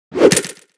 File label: Troll Shooter Shoot Telegraph